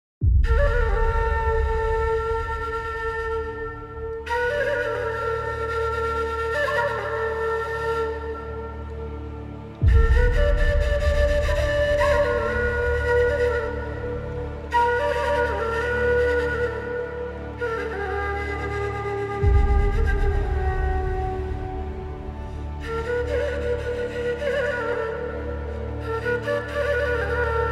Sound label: Middle Eastern Flute